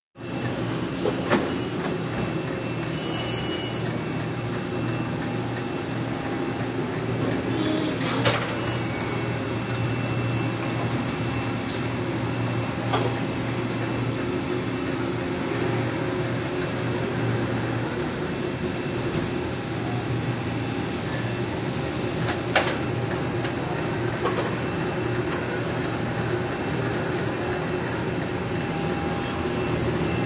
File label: Beeps; 헐리웃특수효과음; 신호-경적